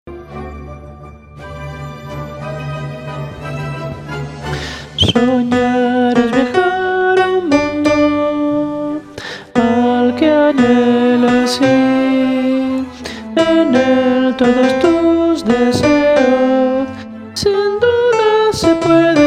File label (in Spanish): Soñar altos